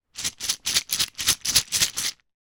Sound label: game scrabble game letter tiles in cloth pouch shake to mix up